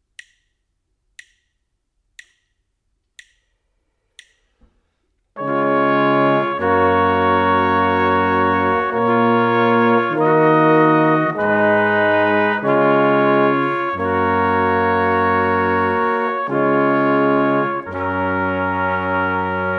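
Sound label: harmonic dictation, brass quartet; ear training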